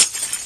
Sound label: glass break; minecraft